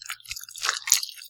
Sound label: extract blood; hell